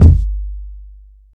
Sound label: long room reverb bass drum sound g key, kick drum sample